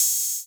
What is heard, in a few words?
626 OH; Crypton - DrumBase5000; TR-606